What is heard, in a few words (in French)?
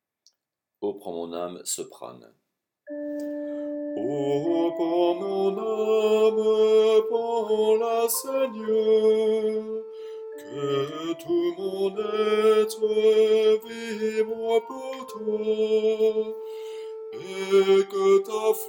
Oh prends mon ame soprano